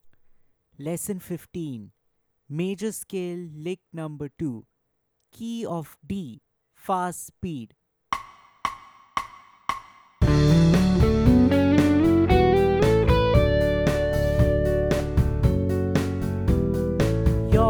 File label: Major Scale lick Playthrough Fast Speed Key of D, lead guitar; The Art of Lead Guitar; Major Scale lick 2